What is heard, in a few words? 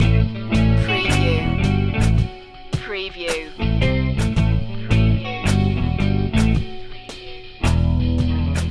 sexy groovy loop